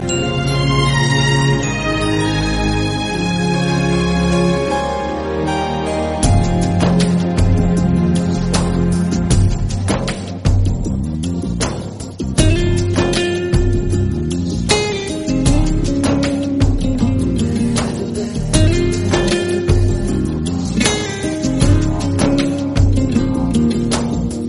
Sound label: Instrumental